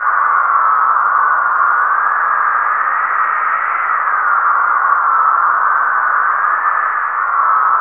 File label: ALIEN WIND; Zero-G Ambient 1